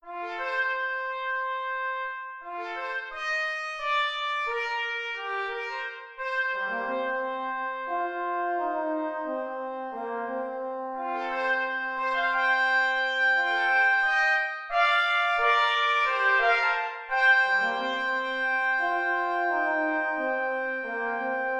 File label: Antiphonal Fanfare - Brass